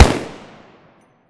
shoot 3p; emx umx32

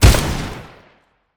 shoot; EMXArms; mg90

